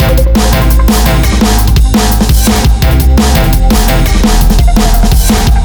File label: drum n loop